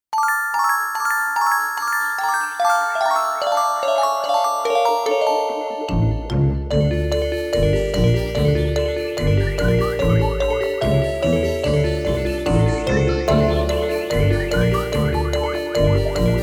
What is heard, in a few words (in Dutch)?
Fluister fluister instrumentaal